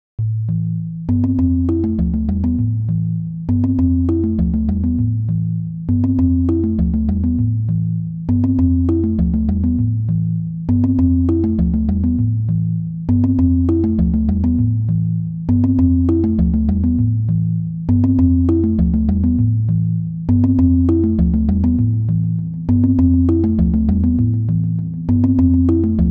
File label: Darkness Piano Loop; gekiban